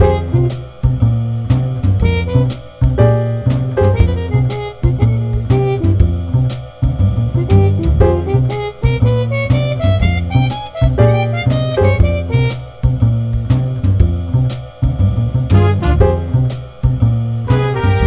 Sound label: 50s cool jazz